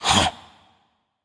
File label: confused; smite; anubis